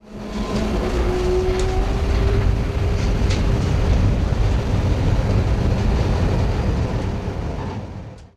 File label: tyne wear metro; simutrans; Pak128.Britain-Ex